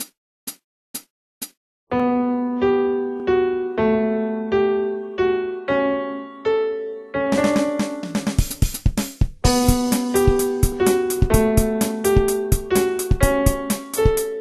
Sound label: drum all